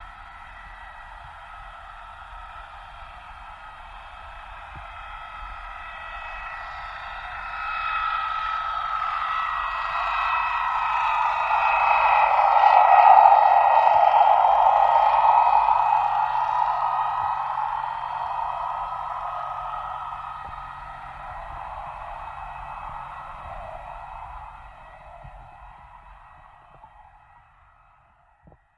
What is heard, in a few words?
Torpedo Jet By, torpedos